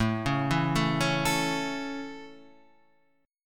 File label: A Major A Guitar Half Step Down, chord